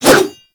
Dagger Heavy Whoosh; Warframe; Weapons; Dual Daggers

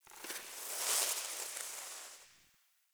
Foliage Growth J; Powers; Fey Eldveil; Eldveil Stillness

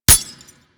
Helmet Impact; Warframe

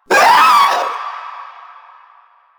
Vocal Short Attack; Enemies; Warframe; Proto Infested; Runner

